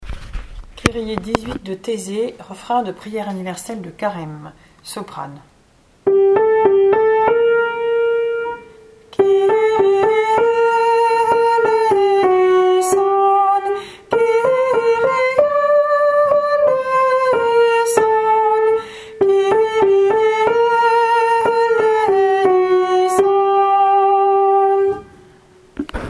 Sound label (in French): Kyrie Taizé SOPRANE